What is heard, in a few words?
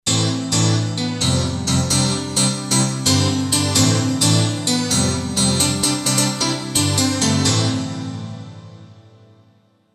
pianostring; synth roland jd800; card demo